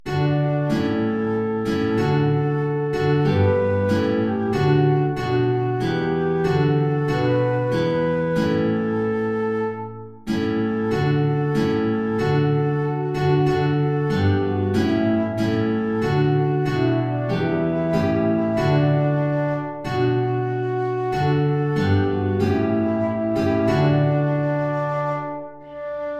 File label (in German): Querflöte & Gitarre (tief); Lobt Gott, ihr Christen, alle gleich